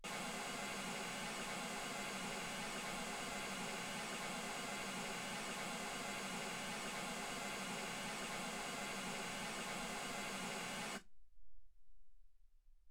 mls16 48kHz

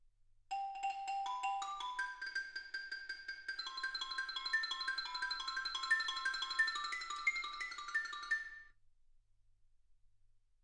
xylophone

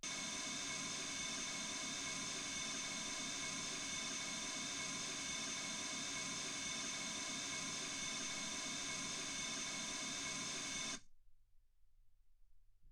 mls16 48kHz

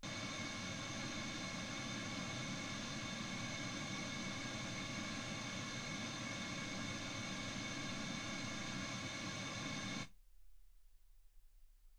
wgn

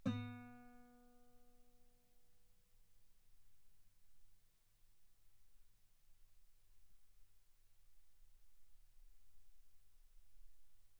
Guitar B3; ff; sul B

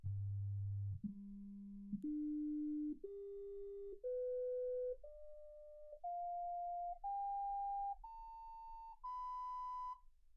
sinus tones 48kHz

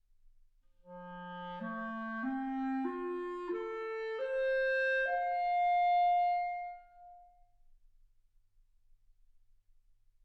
clarinet

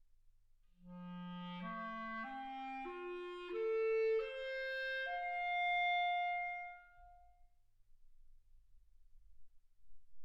clarinet

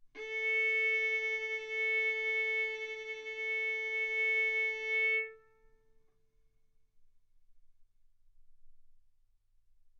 Violin arco mf sul A A4 B4 stereo

